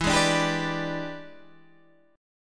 E 7th Sharp 9th, chord

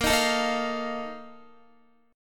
A sharp Major 7th Suspended 4th, down, Guitar; strummed; 4F,4A,5C,5G,5C,6E; JGuitar

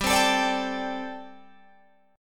G Suspended 2nd Suspended 4th G, Guitar, Open G

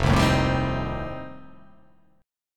F 9th F, chord

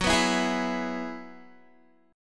Gb 7th Suspended 2nd Suspended 4th, Guitar

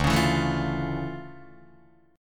D Minor Major 9th D down Guitar Open D

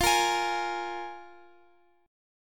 F Minor Double Flat 5th, F, Guitar, Open F; Fmbb5; strummed; x,x,x,12,13,15

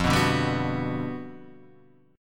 G Major 7th, F; strummed; JGuitar

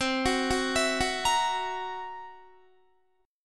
C 7th Flat 5th C down Guitar; C7b5 arpeggiated

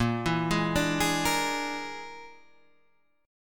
Bb 7th Flat 5th Bb, Guitar Half Step Down

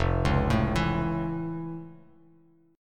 F Augmented Major 7th, Bass Standard; arpeggiated; down

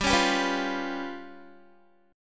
G 7th Flat 5th G, Guitar; G7b5 strummed

